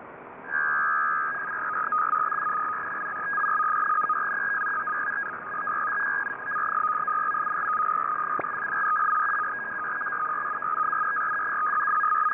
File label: RATT 150 400; 150 Bd/400 Hz; TUR-MIL; NATO ENCRYPTED RATT